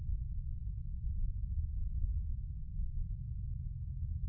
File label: dry air short indoors, ambient wind; css